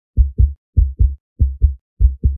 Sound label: plasma recover